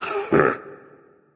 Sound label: hehe; fenrir